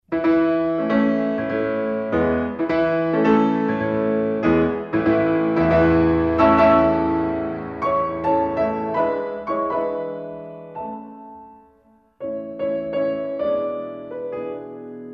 Song without word Op. 85 No. 5 In A Major Allegretto